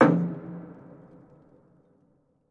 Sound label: metal impact